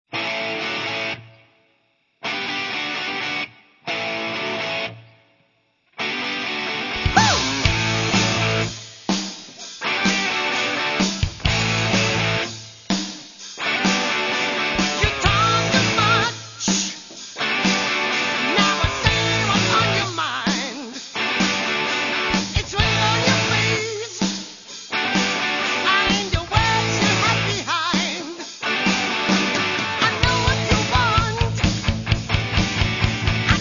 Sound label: slide